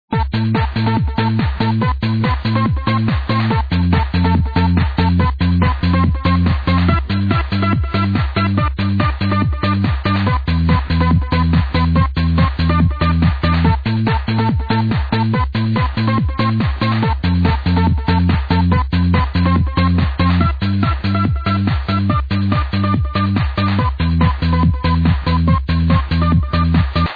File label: unknown trance classic